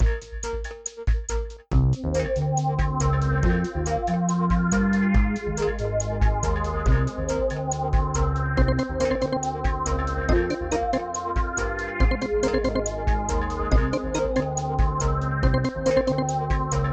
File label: synth